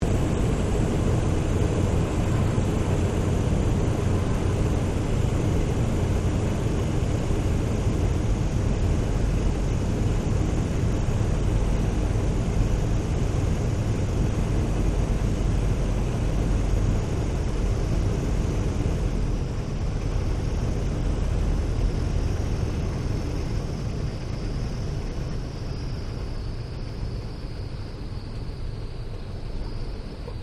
Aircraft Sopwith Pup replica landing interior, Replica of a WW1 Sopwith Pup; 1916; Airplanes